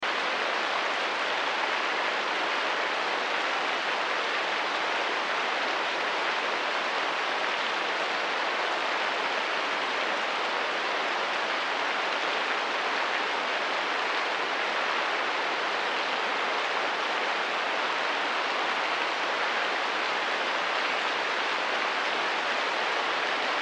Rain Window Interior Perspective Downpour Heavy Steady Loop; Weather; Forest; Blastwave